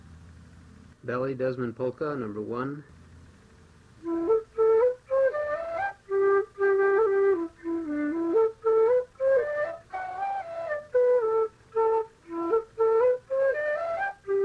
Ballydesmond Polka

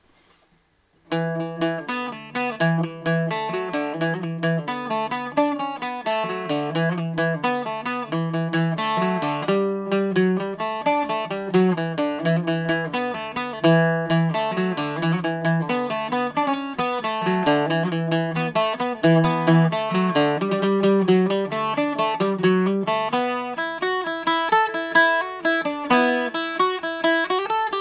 morrisson jig

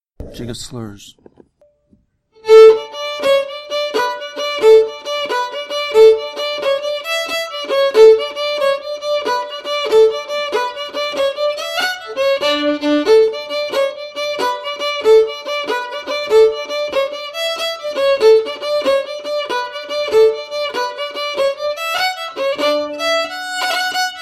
Jig of Slurs slow

